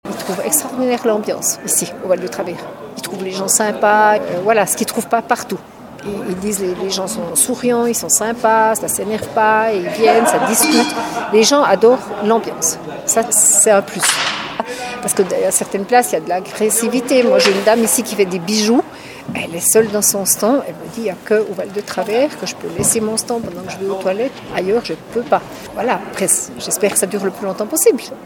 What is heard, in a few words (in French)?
Brocante ambiance; la patinoire de Fleurier; deux jours de brocante